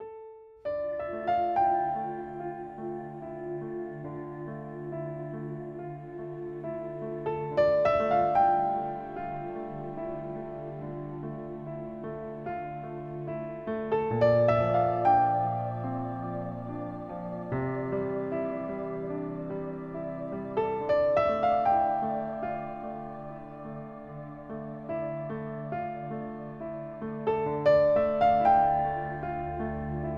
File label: Imminence Underscore, In Suspense; Narrative Mind